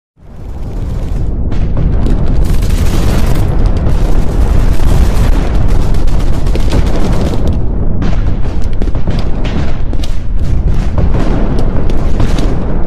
Efecto de Sonido de Guerra Sound War Effect

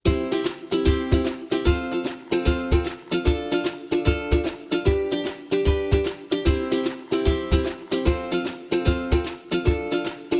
Happy Ukulele Children Background Music

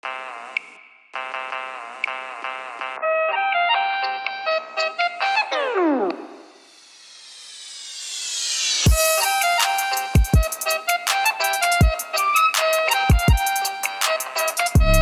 nokia arabic ringtone trap remix

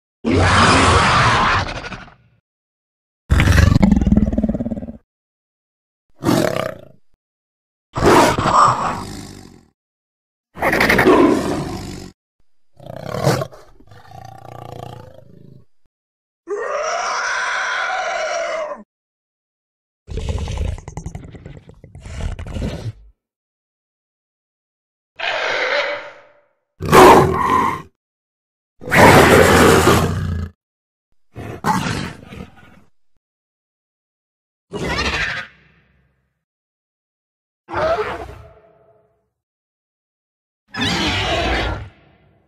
Raptor Sound Effects; Ark Survival Evolved

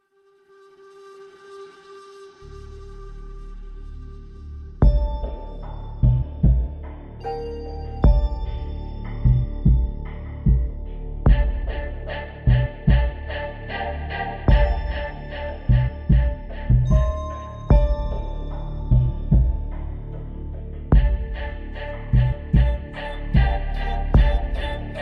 theme strings; prison break theme

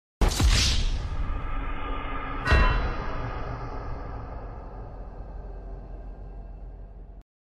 Wasted Busted Gaming Sound; GTA V

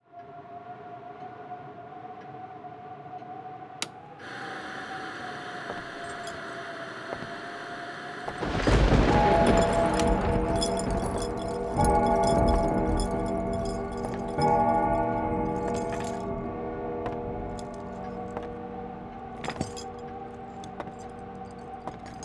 Ghostly Hour

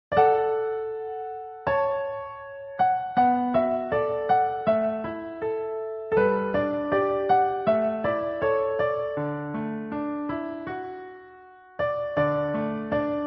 Piano; Boys Over Flowers

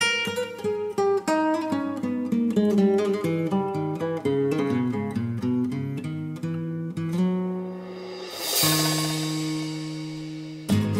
Spanish Guitar

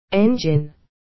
engine

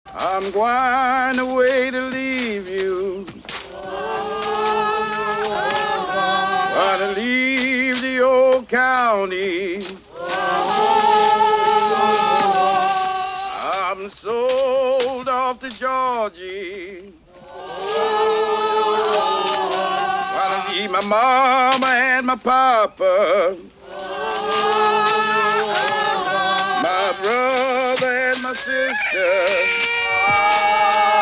chant de travail work song

